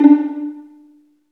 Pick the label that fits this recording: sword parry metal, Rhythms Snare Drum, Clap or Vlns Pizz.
Vlns Pizz